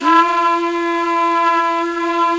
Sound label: Flute, Singin' Flute